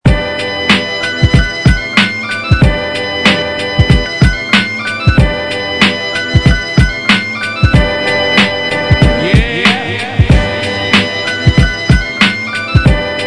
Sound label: flute